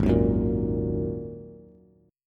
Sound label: Ab 7th Suspended 2nd down Bass; Ab7sus2; strummed; chordshape; JGuitar